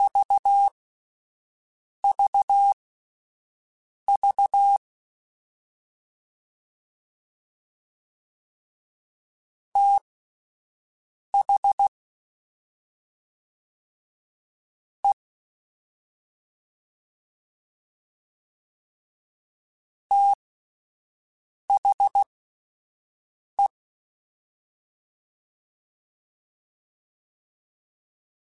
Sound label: Fast TH, CW Code Groups; Mixed; Prefixes